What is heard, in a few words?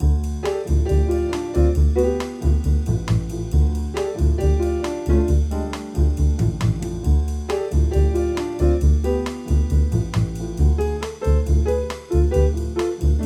Full Piano Audio Recording